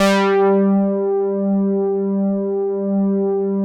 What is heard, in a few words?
OBERHEIMS